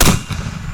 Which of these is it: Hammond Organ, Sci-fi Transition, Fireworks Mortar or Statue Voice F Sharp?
Fireworks Mortar